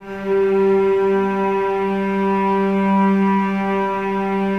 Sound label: Cellos No Vib G 3, String Ensembles; Miroslav Vitous